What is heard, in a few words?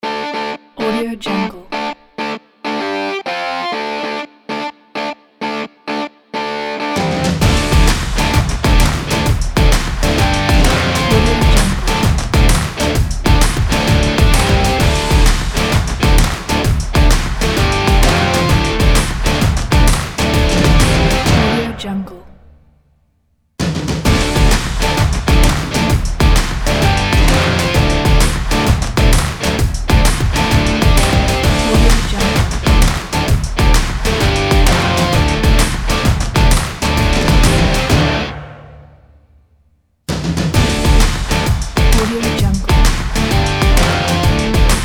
hard rock intro logo